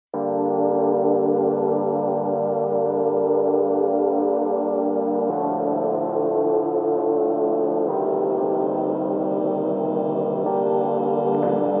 33hz-35hz Low bass

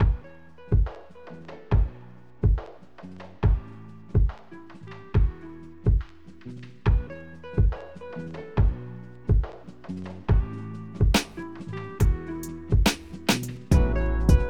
Instrumental

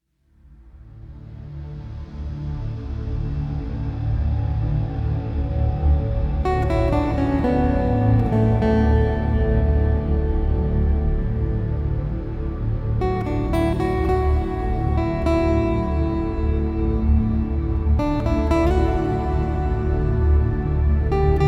Spa Music Relaxation Massage Therapy Music

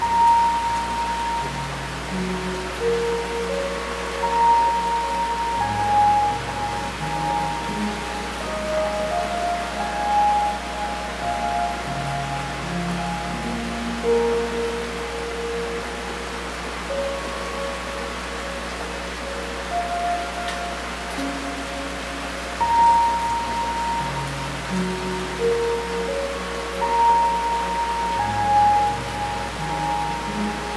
Rainy Sleepy Piano, Nature Noise Rains

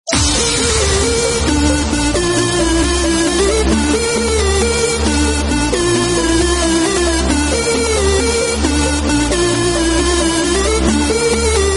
Club Bass Beat

